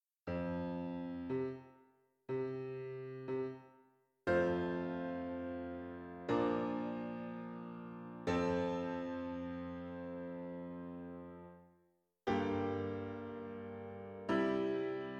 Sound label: Piano